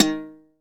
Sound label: HALAM PICKS; Best Service; World Colours